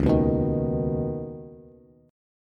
Db 7th Suspended 2nd, Bass, Db7sus2 chord; JGuitar

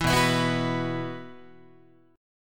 B Suspended 4th, Guitar; Bsus4 strummed; Bsus4 chord; JGuitar

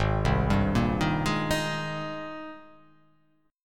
Ab Major 9th Ab down, chord; AbM9; arpeggiated

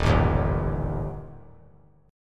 D sharp Major Flat 5th; strummed; D#Mb5; JGuitar

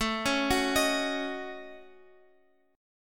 A 6th Flat 5th, Guitar, Open F; A6b5; arpeggiated; JGuitar

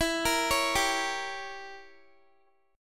E Diminished 7th E, Guitar; Edim7; arpeggiated

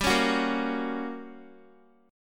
G Minor Major 7th Flat 5th G, Guitar; strummed; x,10,13,11,10,x; 4F,4A,5C,5G,5C,6E

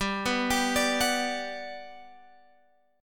G Major 7th, G, down, Guitar, Open G

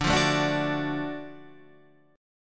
Gb Minor Major 7th Sharp 5th D, guitar

